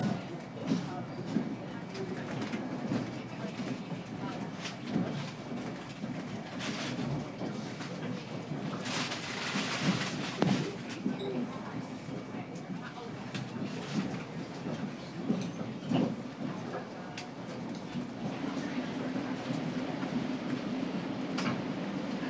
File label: Approaching Grand Central